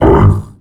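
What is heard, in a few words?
biomech air voice punch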